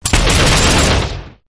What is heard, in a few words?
hammer turret fire windup, turrets; enemy; gow